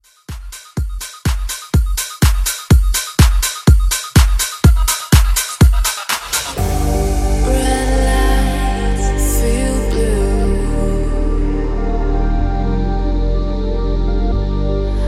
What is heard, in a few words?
Future House Redrum; Remixfreakz